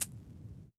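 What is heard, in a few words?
Vinyl Shaker; drum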